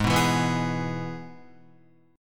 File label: G sharp Minor Sharp 5th, Guitar; G#m#5 strummed; JGuitar